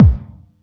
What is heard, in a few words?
Yamaha EX-5